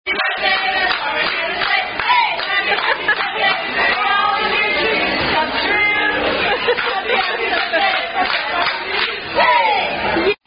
Happy Happy Birthday; Red Robin

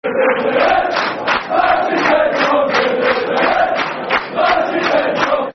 Football Chants, Man City; Man City Mcfc

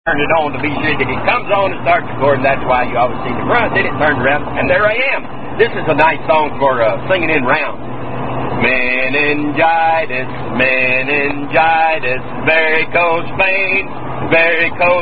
Meningitis, Sung In Rounds; Tune Fere Jackea

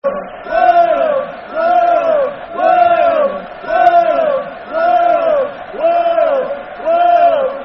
Wales, Wales [Football Chant (Soccer Song)]

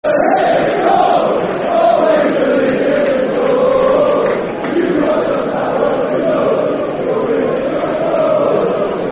Alan Lee Goal, Football Chants; fanchants; Ipswich Town Itfc

